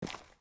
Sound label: FS Dirt, Footsteps